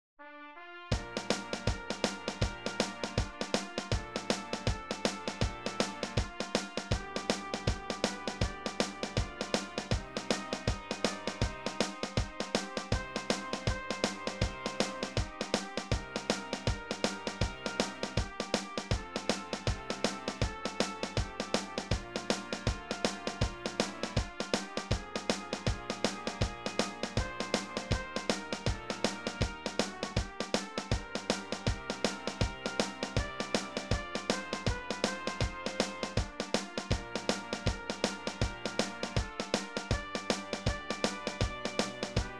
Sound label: perc